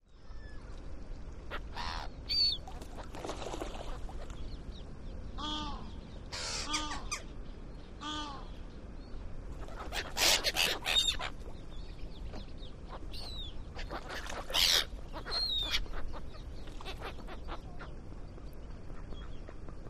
Birds Fighting Lake Par, seagulls

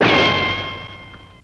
Bullet Ricochet Ban, riccos

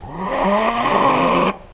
Hyena Asthmatic Gasp

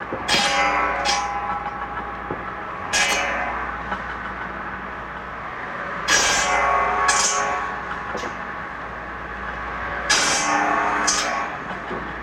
Saw Mill Shrt Cuts Bl, saws

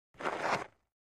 Baseball FS Cleats; baseball fx & games

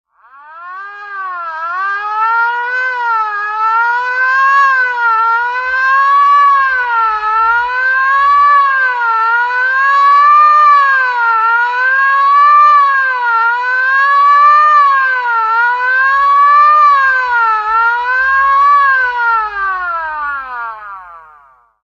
Siren Hand Driven Sir, fire truck

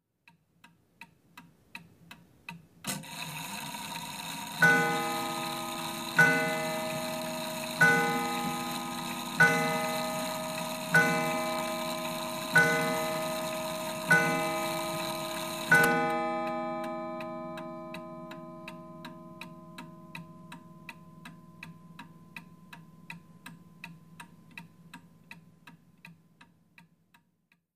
Old Clock Ticking, ticks

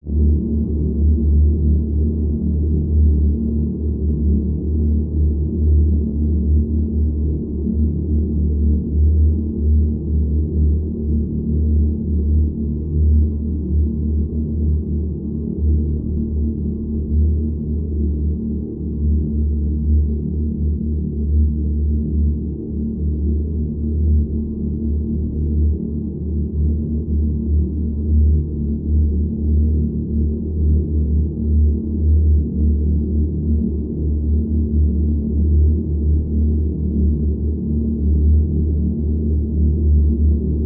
Ambiance Tonal Bass, drones & tones

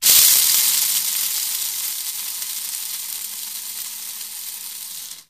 Coffee Grinder: Electric Machine Spin.

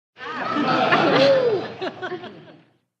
Crowd Light Laughter, medium

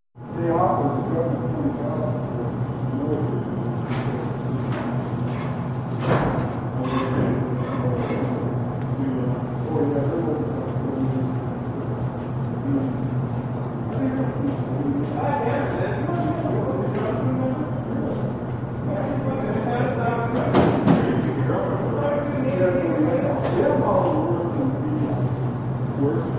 Airport Aircraft Han, hanger int

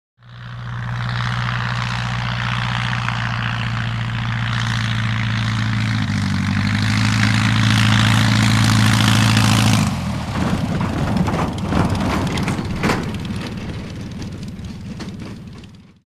Prop Plane Taxi Fokke, ww1 various ext

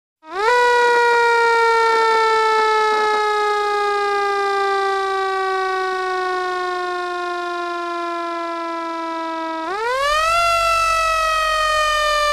Sirens, Close Perspective, Federal Air Horn.

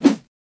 Rod Metal Swish

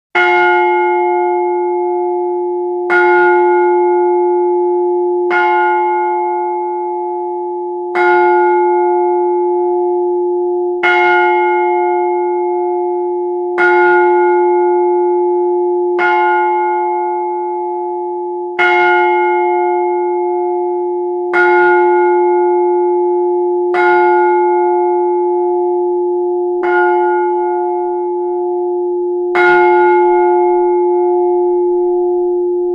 Church Bell Large F3, bell towers

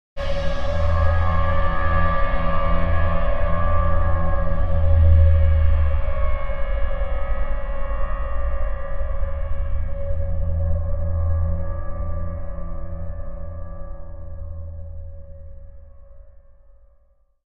Bed Fluctuating Modu

